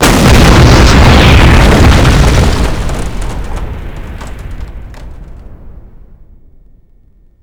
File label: train explosion round combo; WAR